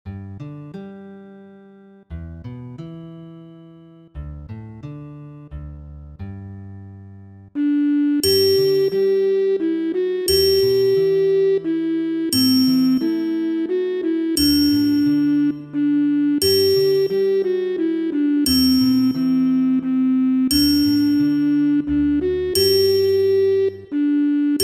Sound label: Guitar; Ash Grove